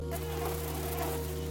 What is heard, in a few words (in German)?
biene klein; botanicula